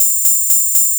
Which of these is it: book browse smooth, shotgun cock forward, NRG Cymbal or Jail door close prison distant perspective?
NRG Cymbal